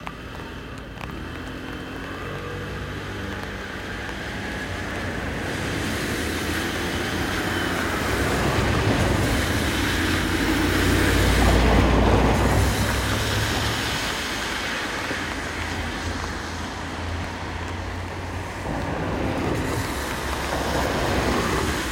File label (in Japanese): ファミリーマート練馬大泉町店